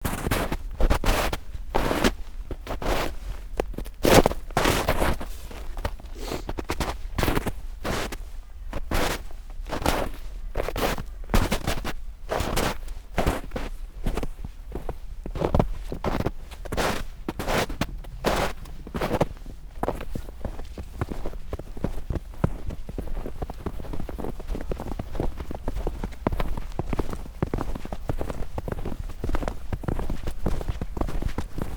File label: Snow Footsteps